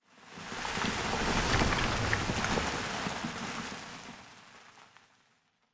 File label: Explosion Rumble Exterior Close Debris; Boomtown; Titanfall 2